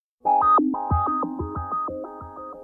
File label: Motorola Notification Ringtone